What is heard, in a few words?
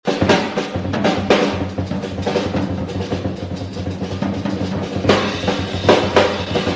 drumsolo